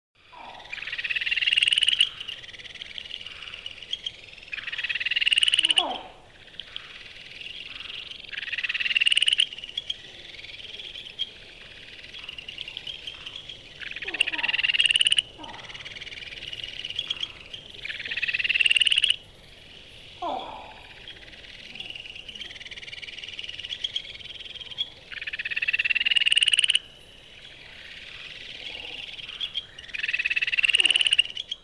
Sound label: ryukyukazikagaeru call, frog